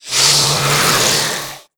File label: threaten, headcrab black; creatures; vox